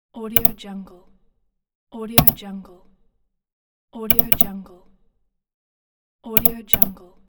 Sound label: mouse click